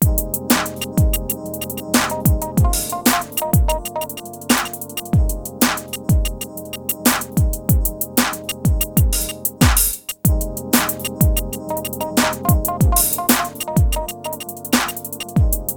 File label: BEAT, 93-96bpm; PlatinumPhattCD1; E-MU Producer Series Vol. 8 – Platinum Phatt; Beats